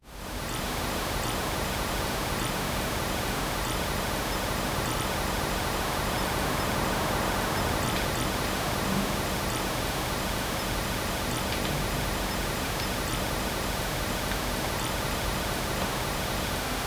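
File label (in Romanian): sunetul pădurii noaptea, crickets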